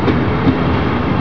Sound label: train